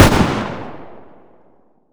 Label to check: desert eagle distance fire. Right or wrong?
Right